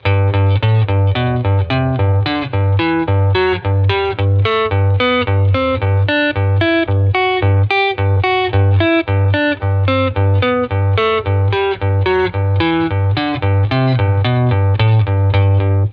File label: Repeating Bass Two Octave Scale